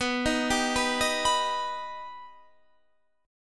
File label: B Augmented B Guitar Standard, chord; arpeggiated; JGuitar